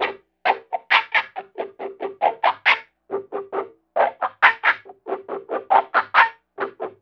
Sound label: FILT, Bizarre Guitar, WEIRD WAH; Spectrasonics